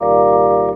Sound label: talk box chord a